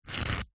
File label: office brick pen ball point score mark